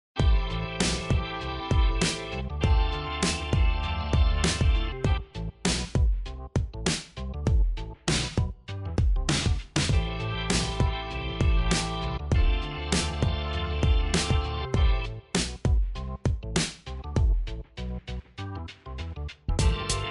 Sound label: Funky Three